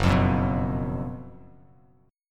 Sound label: F sharp Major 7th Suspended 4th, Bass Standard; F#M7sus4 strummed